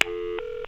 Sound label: phone disconnect